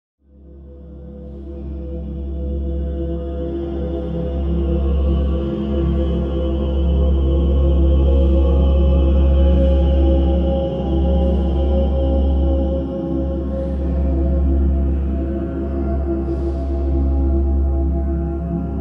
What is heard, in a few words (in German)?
chorglocken teil